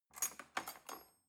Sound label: cutlery knife drawer handle ring